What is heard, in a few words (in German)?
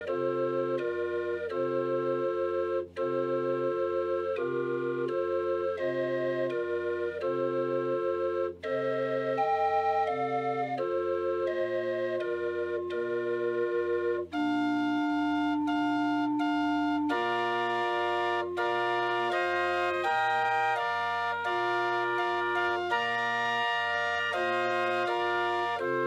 drehorgel musik gottesdienst; drehoergelimarkus